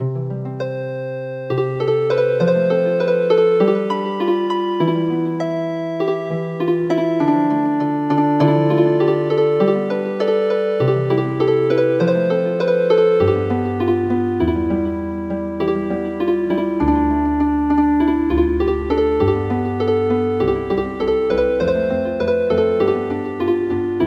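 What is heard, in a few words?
Healing Harp; Good evening Kings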